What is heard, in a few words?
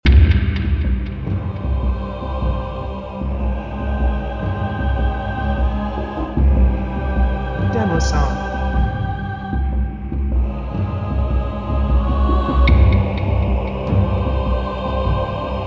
Choir Loop